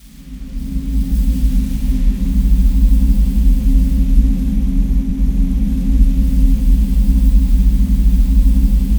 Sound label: tunnel rain, rumble rain; Spectrasonic Distorted Reality 1